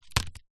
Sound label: industrial duct tape ball sticky set down wood